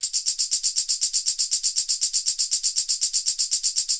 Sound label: Tambourine Loop; Sonic Foundry (Sony Creative Software); Electronic Point Blank